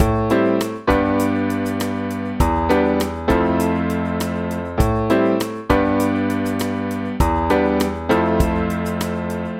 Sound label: Am G Em F